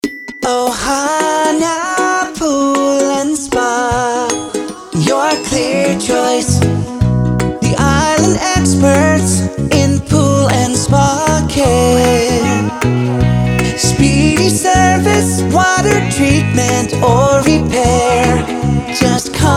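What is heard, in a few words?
Full Sing, spa sales and service jingles, acapella jingles; Ohana Pool Spa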